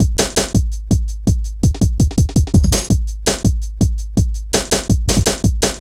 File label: Ezee Rolla, Drum Loops; Zero-G Jungle Warfare 1, 2 & 3